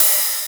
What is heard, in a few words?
Hat; MINDMINE